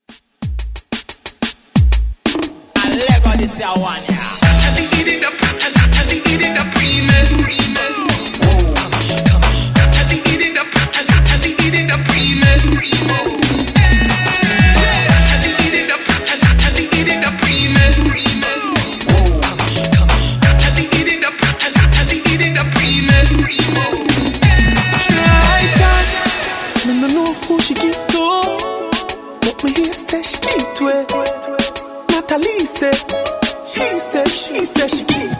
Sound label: Hype Rmx Bpm 90